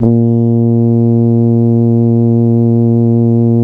A#, Synclav FM, BRASS; Big Fish Audio

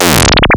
L F O 2; AMG - Vince Clarke Lucky Bastard

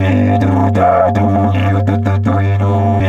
Didge 80 BPM, Didgeridoo

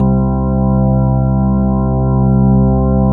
ROCK ORG C; KEYS AND GUITARS; Synclavier Sampler Library

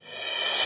Reverse Cym, Cymbals; Vengeance; Essential Clubsounds Vol.4

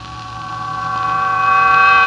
Reverse Bell OS; Sonic Foundry; Rondo Brothers Trip-Hop Loops & Samples

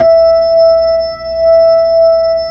B-3, Hollow; Keyboards of The 60's and 70's

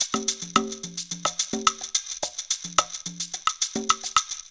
PERC LP, PERC LOOPS; Zero-G; Funky Elements

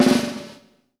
BUZZ VERB, PROC SNRS, Drumworks; Sampleheads; New York City Drumworks VOL-1

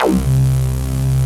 Rezzy Synth C1; Digital Sound Factory Xtreme Lead

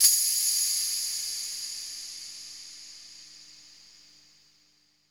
tambo, big rooms

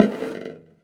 Prepared Drums

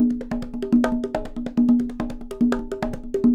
Percussion, 144; Sampleheads; NYC Percussionworks; 90 s Sample CDs

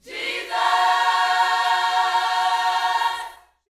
Vocal, Gospel, PHRASE; Spectrasonics

